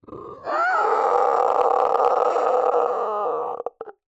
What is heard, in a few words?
screech monster roar; the library by mtc; Sonniss GDC